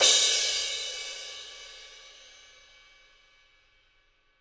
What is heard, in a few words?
CYMBALS; Living Drums; SD CYMBALS; Sampleheads; Peter Erskine